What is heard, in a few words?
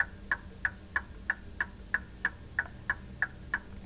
Mantlepiece Clock Ticks